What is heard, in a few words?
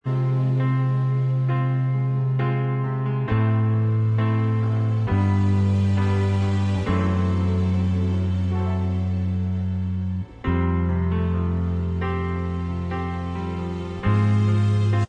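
instrumental, backing track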